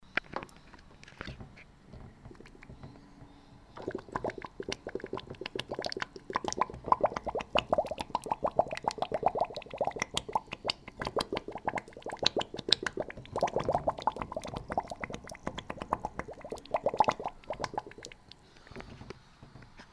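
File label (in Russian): выдувание пузырьков в жидкости через соломинку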